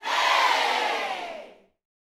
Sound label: HEY, gospel shouts; Spectrasonics; Vocal Planet